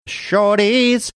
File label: singing